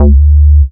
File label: Bass Shot D